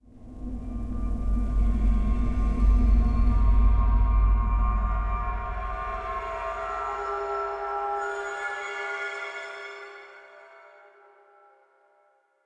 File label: cave, ambient; Minecraft; Votive World